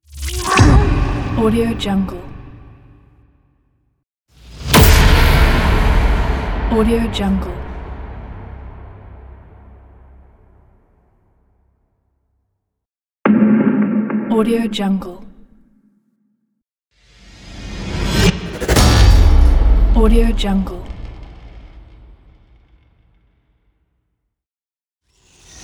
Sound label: video hits sound pack